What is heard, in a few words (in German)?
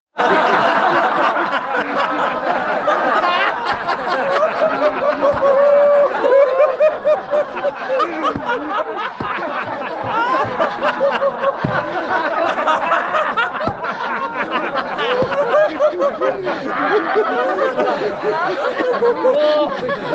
Lachyoga